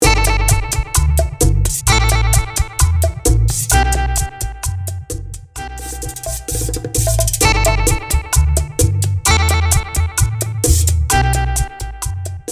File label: Simple Intro Outro 130 BPM